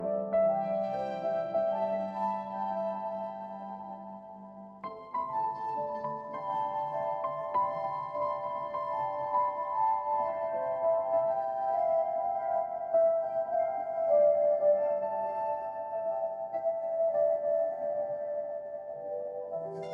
ringtone anime

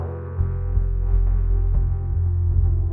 funny

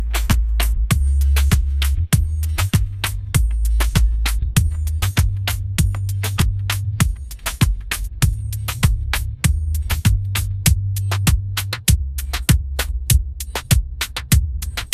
ringtone reggaeton

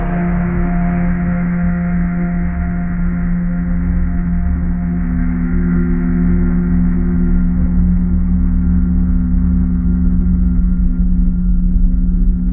ambient space

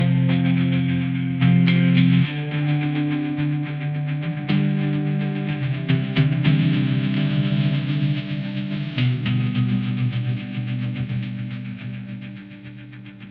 ringtone pop

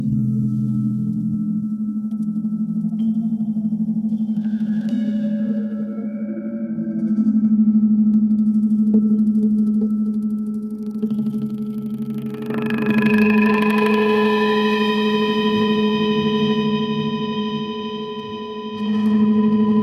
ambient meditation

